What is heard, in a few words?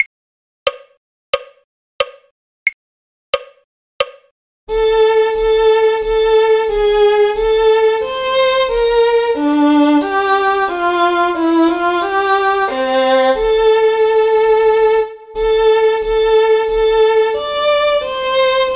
violin; carols